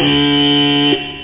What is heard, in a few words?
BUZZER; Hottest 5 (1995)(PDSoft)